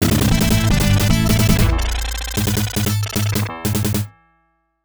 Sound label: Miss; Maze of Flott; arcade